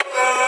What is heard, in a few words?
F# VOX STAB; funky; Loopmasters; Funky Underground Vol 2